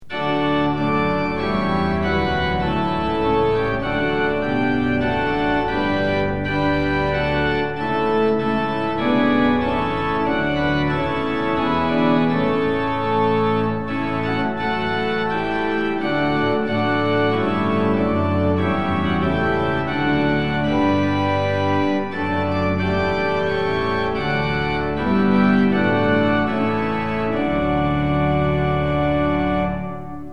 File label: tune; hymns; lillenas